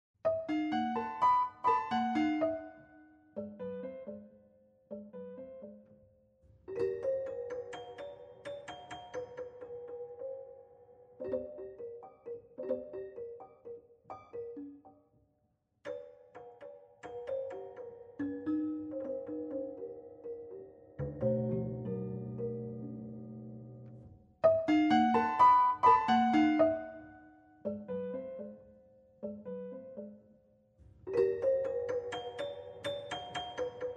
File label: sonatas and interludes for prepared piano sonata; cage; sonata 2